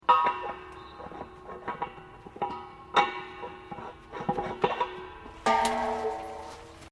cylinder gas presurized pipe detatched, Home heating gas bottles being replaced; Machinery